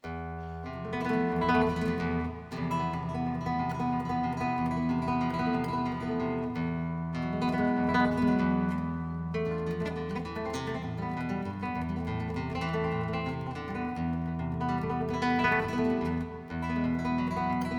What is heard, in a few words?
Acoustic Improvisation